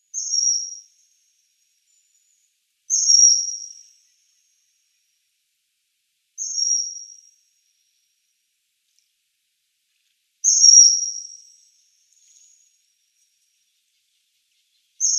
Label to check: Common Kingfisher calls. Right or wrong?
Right